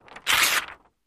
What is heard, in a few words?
Paper Page Flex Rip; paper mvmt